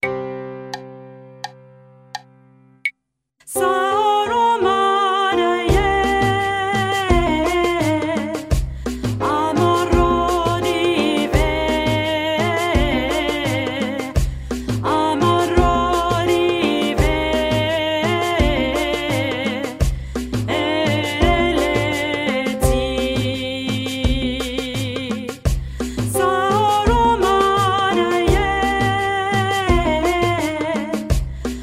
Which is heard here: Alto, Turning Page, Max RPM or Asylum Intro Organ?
Alto